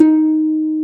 harp D#; Timidity

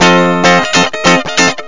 guitar plink c; Epic Collection 3, The; Epic Marketing; muzak

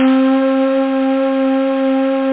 panpipe; Amiga-CD 1997 #7-8; algomusic; melody

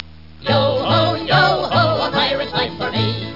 yoho; Amiga 8-bit Sampled Voice

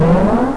Blowers On; Glider PRO

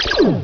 peeyoop; Exile II - Crystal Souls

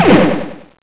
WDK Thick Laz; The War Engine

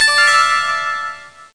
CHIME

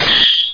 Right Spot Target; Crystal Caliburn™ DEMO

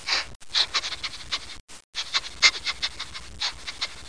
Foxes; Bible Fun Pack; Education Publishing Concepts

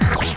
get vitamin

